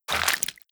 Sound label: debris flesh, bullet impact debris